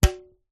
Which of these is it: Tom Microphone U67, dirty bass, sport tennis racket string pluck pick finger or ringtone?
sport tennis racket string pluck pick finger